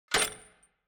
morgenstern put; player

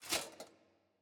ambience room armor training

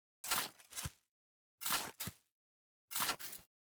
block digging up

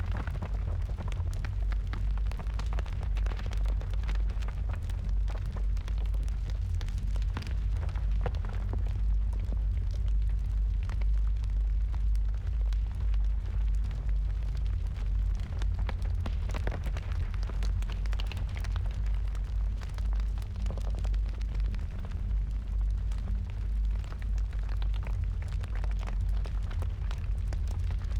Fire Sphere Idle Loop; TANK; 3Souls

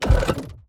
environment building barrier place

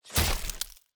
Drone Laser Chitin Impact; TANK; 3Souls

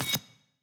ambience room dark temple ritual stone ready

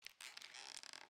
ambience room lair work layer

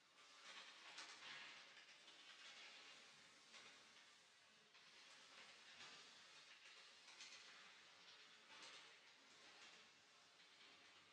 ambience room portal base layer loop

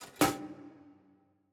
ambience room bucket training

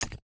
ui click

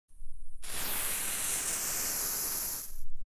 ambience room butchery working layer

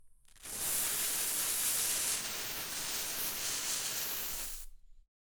ambience room forge working layer

